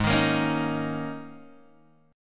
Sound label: Ab Suspended 2nd Suspended 4th Ab down Guitar Open G